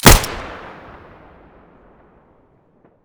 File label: muzzle fiveseven stereo, weapons